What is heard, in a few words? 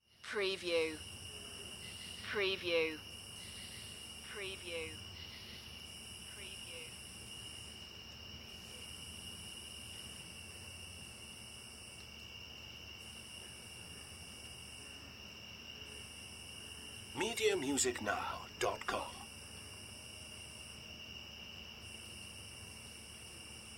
Pond Crickets Frogs